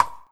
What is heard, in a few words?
Foot Step; Bora